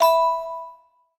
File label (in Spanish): SFX Ruleta Giro; trivia crack